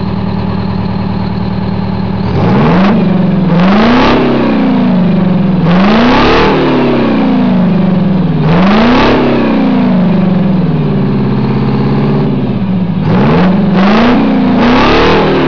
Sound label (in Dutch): Busgeluid Eagle